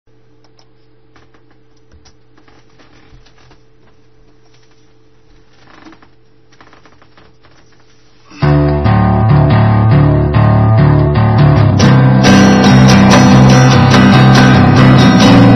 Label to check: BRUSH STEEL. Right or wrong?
Wrong